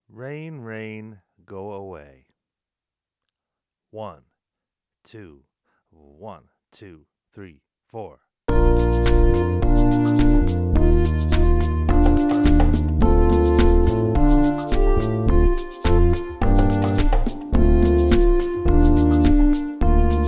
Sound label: play alongs Rain Rain